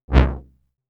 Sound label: Wide, synthlead